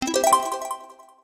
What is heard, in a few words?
gift open; frontline